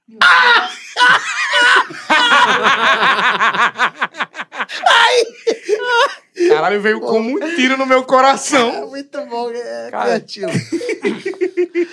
risada

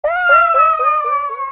caindo

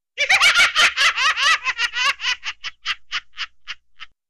risada bizarra

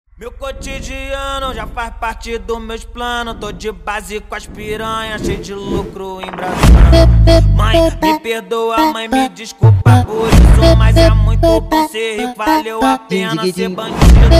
funk

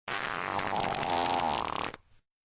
peido cagado

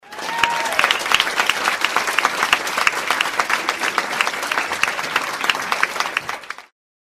efeitos aplausos

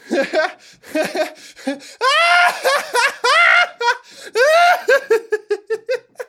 risada debochada

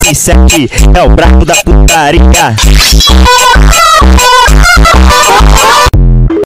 funk gemidos

